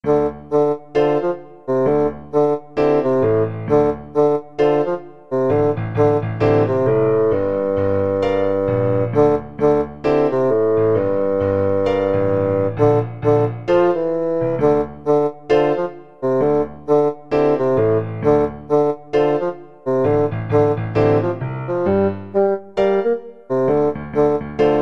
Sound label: hip hop house, bassoon